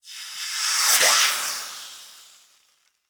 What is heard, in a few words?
Steam Sizzle Hit